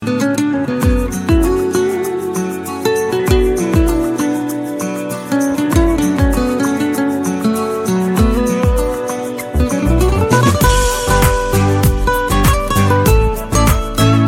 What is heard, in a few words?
lovely music ringtone